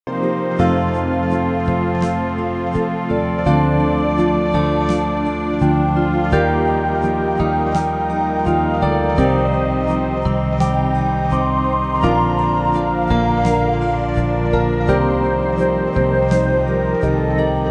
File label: a soulful melody played on string instruments